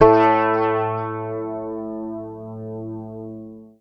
Bass; Sampleheads; Will Lee Bass Library

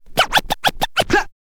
scratch kit, vinyl

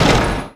Perc Hit, drums; One Shots; Drums & Drones

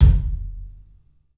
KIK, LIVE CLUB KK, Drums; 3D Sound

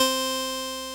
ORG VOX C, Continental; Roland LCDP09 Keys of the 60s and 70s 1

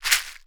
AFRICAN, African percussion

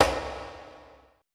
CONGA SLP L; BPC DRUMS

